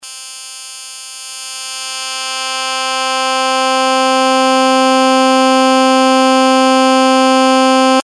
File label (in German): puls cutoff hp